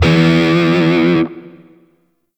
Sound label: Shawzin Flourish Oct One E; Warframe; Grineer Shawzin